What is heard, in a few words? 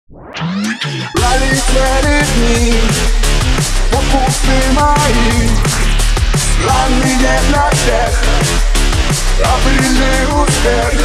DNB